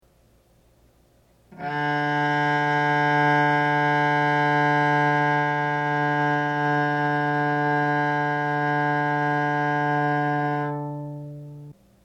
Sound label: Cello D; tuneguide